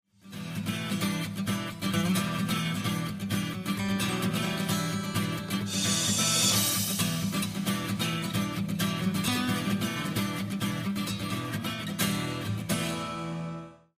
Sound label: lost round; music kits custom